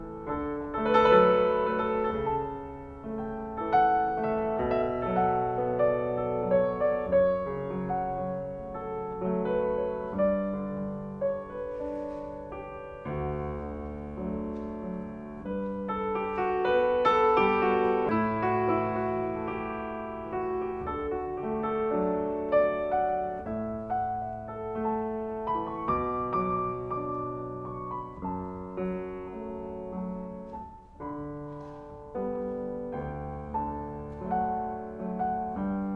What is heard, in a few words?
Riff on Pachelbel